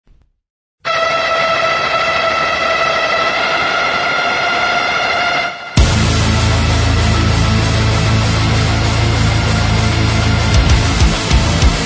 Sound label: Death Metal